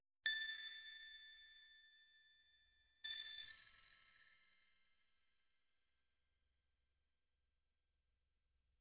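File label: high pitched magical sprinkle, fairy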